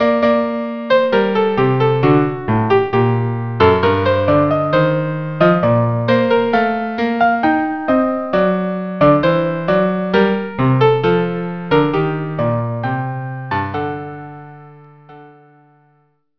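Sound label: violin cello; Almira Dances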